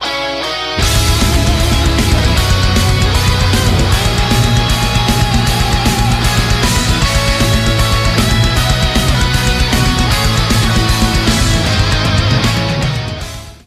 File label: intro music; thaimemes; agent pink